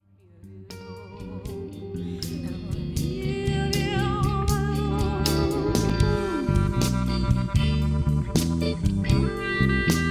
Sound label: clarinet blues